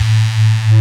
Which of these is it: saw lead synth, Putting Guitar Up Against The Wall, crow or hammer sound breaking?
saw lead synth